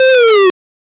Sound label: short bass pop bitcrushed